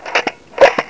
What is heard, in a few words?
charge swipe; sound effects; english; pinnacle